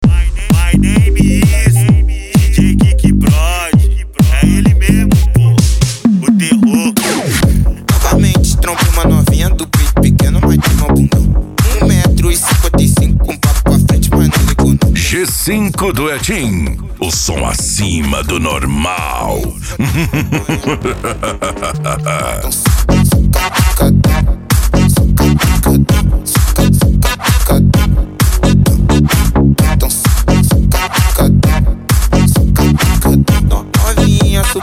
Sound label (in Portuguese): mega funk e funk mega bass